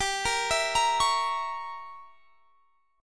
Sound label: G Diminished 7th